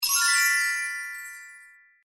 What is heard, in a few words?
reward; Dreamcatcher